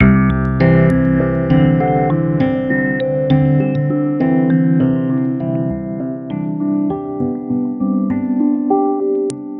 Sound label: Bluebird, keys